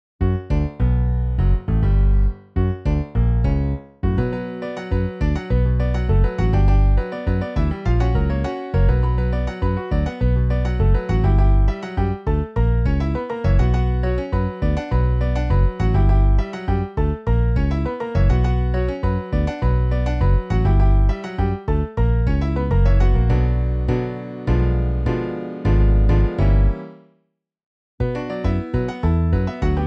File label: Piano Bass